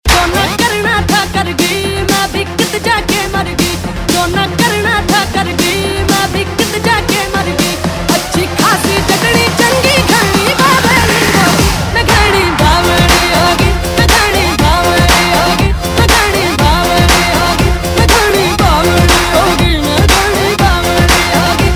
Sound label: Remix, Bollywood; Tanu Weds Manu Returns